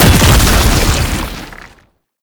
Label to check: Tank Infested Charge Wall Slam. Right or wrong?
Right